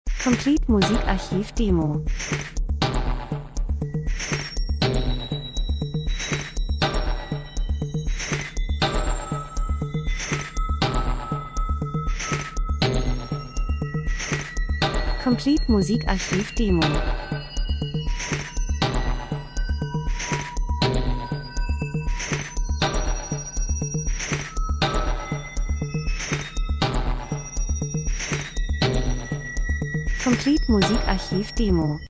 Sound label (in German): drums, rhythmus; Elektro Rhythmus Nacht Club Bar Dunkelheit Fremdartig Urban Akzentuiert Besonders Dokumentation Reisefilm Lifestyle